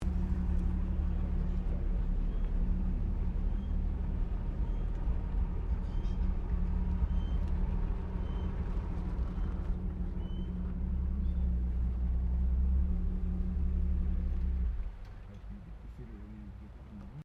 CAR HOLDEN HD PREMIER INTERIOR IDLING SHUTDOWN, Classic Australian cars